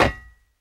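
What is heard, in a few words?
quiet knock on motorcycle fuel tank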